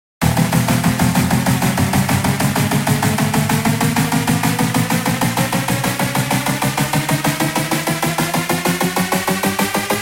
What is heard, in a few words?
Massive Kick